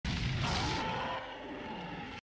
Rhinosaurus Roar

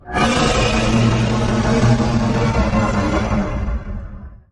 roar pitched up; Cloverfield

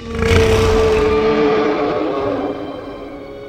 Minotaur Roar

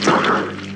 Giant Octopus Roar; Showa

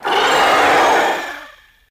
SFX; Mothra Leo

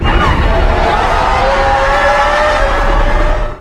roar; MonsterVerse Rodan

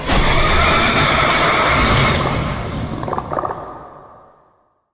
roar

